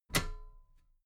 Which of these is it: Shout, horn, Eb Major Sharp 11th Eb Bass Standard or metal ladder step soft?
metal ladder step soft